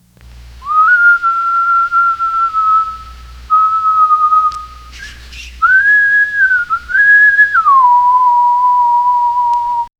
a lone human slowly whist, breathy

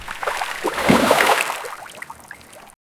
swimming with boat sound

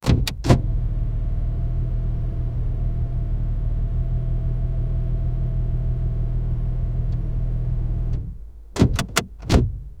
shift up in car

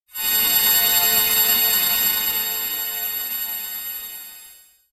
arcane rune shield summon; spell

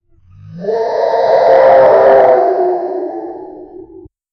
dinosaur

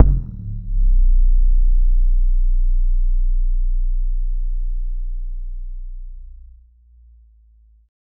boom bass; sound effect

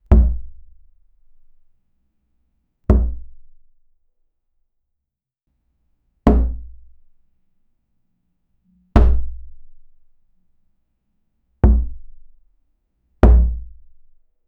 a heavy realistic thud of

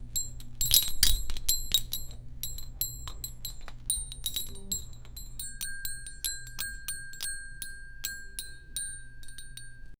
workshop ambience light

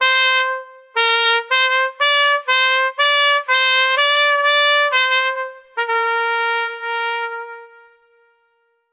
trumpets fun jolly

